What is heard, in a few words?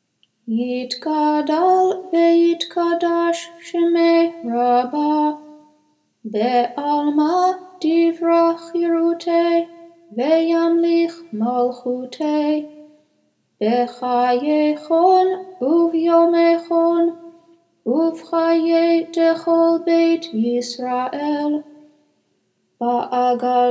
kaddish shalem weekday minor; shabbat mincha service